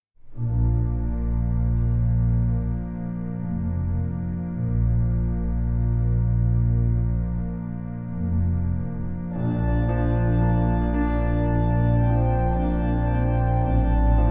Grazioso

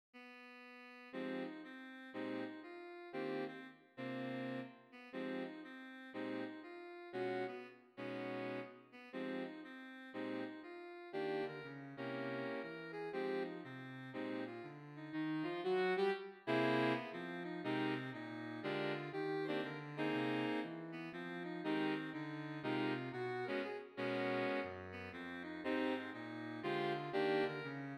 saxophone audio

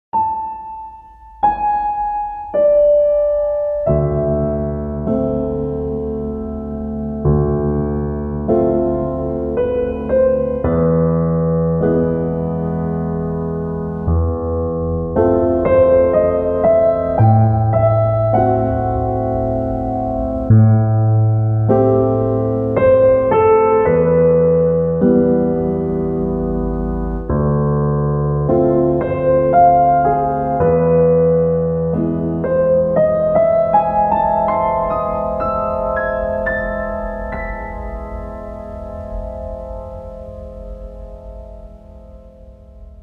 Music for Piano

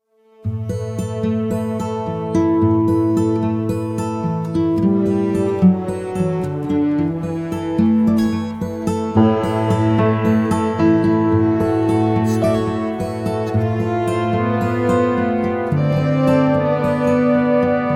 Horn

